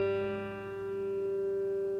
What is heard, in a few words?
g string